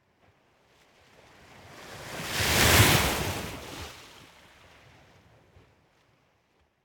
Ocean Surf Water Lap On The Coast Surf Medium Faster Waves; Hollywood Edge; sound designer's toolkit v2